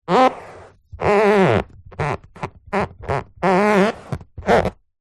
household leather seat cover squeak rub movement hand